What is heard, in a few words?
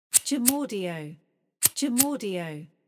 Cigarette Lighter